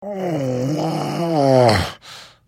Hit, Vo; The Elder Scrolls III - Morrowind; Morrowind GOTY GOG

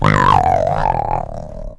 moan; The Elder Scrolls III - Morrowind

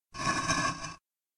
Medium Rumble Walls

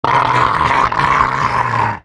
scrm; The Elder Scrolls III - Morrowind

